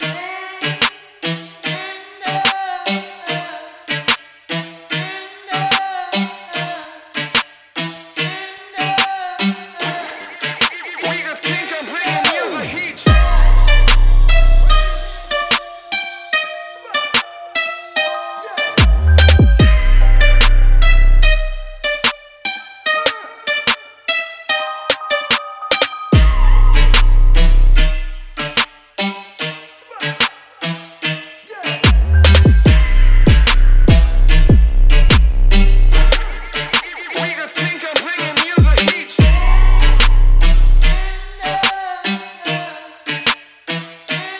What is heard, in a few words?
gospel club 147 bpm